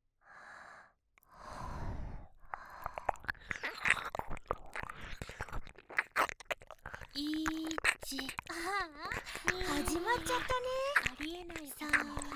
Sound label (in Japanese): 耳舐め絶頂エンドレスパート; 迷い子の催眠